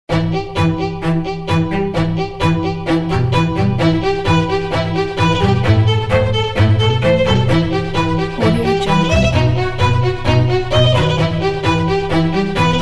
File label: viola; commercialmusic